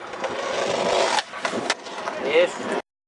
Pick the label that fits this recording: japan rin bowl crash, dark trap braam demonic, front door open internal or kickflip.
kickflip